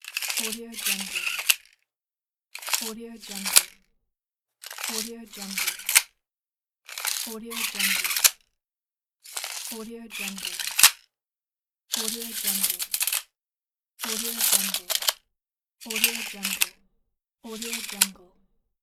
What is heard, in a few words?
the measuring tape